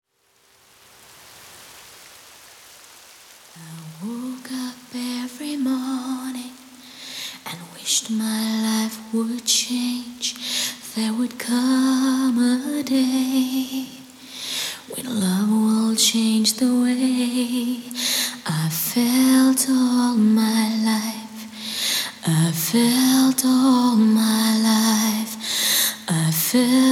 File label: Sad Rain Version; sacred evil 2006